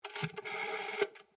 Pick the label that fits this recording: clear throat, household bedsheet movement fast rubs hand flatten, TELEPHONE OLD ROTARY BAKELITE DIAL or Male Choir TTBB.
TELEPHONE OLD ROTARY BAKELITE DIAL